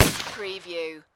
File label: Pistol Rhuger 44 Magnum Single Shot Exterior At 45ft